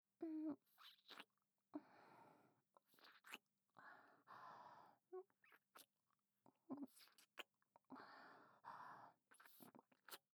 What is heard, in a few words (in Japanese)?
ソフトキス